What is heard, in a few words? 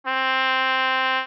trumpet